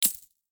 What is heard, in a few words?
coin